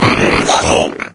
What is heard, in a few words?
pain, maggot; doom3